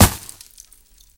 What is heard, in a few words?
sand, impact bullets sand; hl2